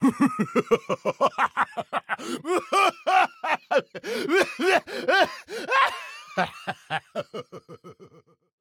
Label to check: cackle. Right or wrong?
Right